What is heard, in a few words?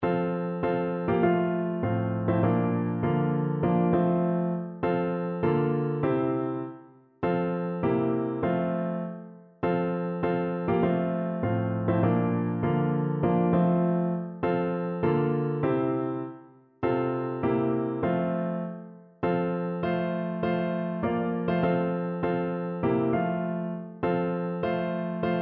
not one, piano